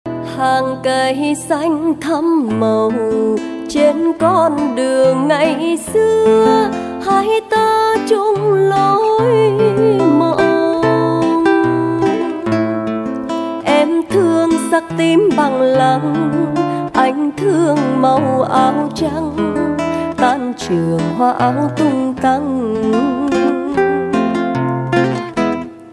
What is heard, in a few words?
guitar bolero; nhac chuong